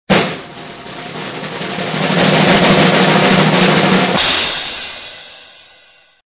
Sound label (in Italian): Rullo Tamburi; Rullo di tamburi